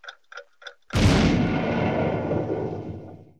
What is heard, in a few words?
time bomb; Crash Bang Boom